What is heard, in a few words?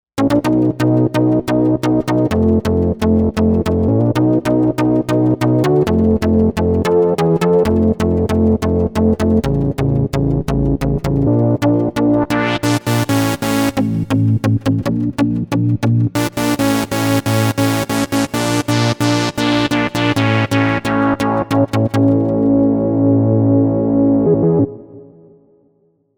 DanzChord